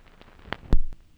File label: crackles, Vinyl